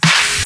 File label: Moody Good Snare